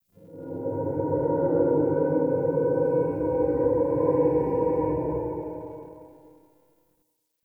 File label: Exterior Distant Calls Angry; Ambience; Warframe; Duviri